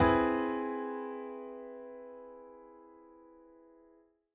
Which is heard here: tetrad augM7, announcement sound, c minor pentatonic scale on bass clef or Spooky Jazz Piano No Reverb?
tetrad augM7